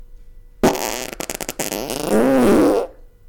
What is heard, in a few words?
Cartoon Fart; Real Fart